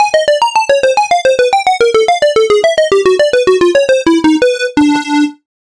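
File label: Falling Asleep Zzzz, MIDI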